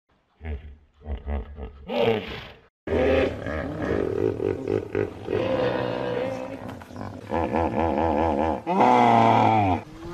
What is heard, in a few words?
Hippo Sound; Hippo Sound Effect